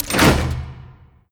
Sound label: boombox box; wilbur